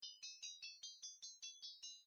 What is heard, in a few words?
ping